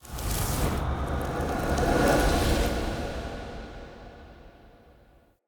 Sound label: Secret Fire Hazard Cleared; Puzzles